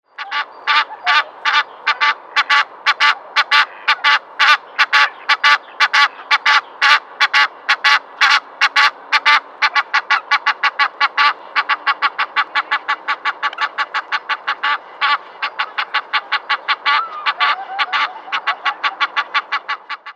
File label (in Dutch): nijlgans; Zang en andere geluiden van in Meijendel broedende soorten; hdykstra